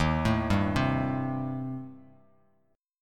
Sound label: Eb 6th Flat 5th Eb, Bass Standard; arpeggiated; 11,12,5,5